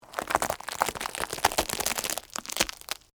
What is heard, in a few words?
Texture, Crunch, Footstep, Grind, On, Ramen, Noodles